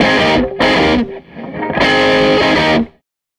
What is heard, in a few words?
Tele Guitar Loop